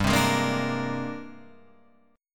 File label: G sharp Major Sharp 11th G, chord